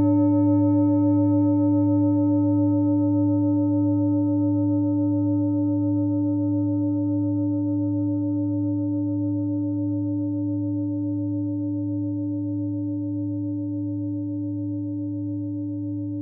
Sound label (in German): klangschale nepal, Klangschalen Nepal